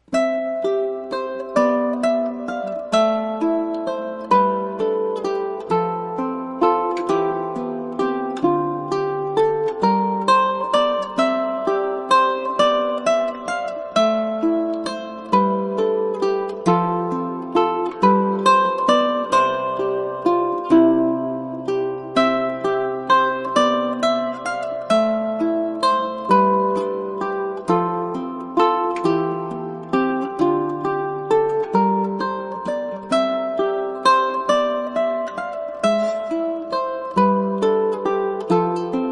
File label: duet in harmony